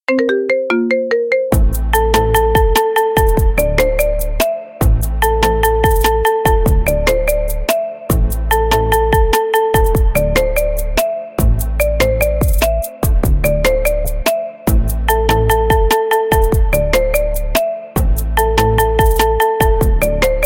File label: marimba remix